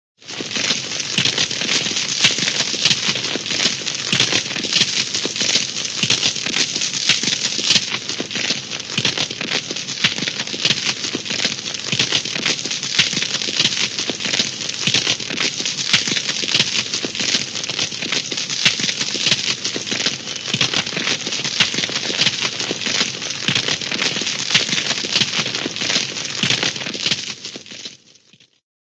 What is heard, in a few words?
crackle, fire crackles up close; cartoon